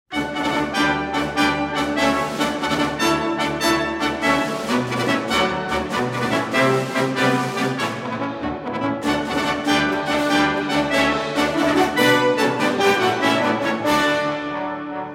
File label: Symphony In Brass; Mvt. 3. Allegro vivace